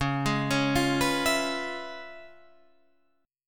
C sharp 7th Sharp 9th, C sharp, down, Guitar, Open D